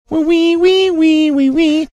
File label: singing portion